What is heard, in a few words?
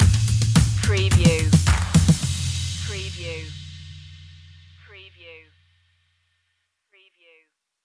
taking flight stinger